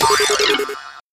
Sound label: wrong answer